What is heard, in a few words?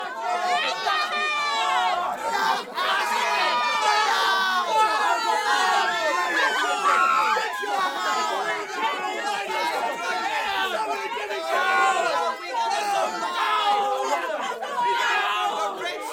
Upset Crowd Yelling Loop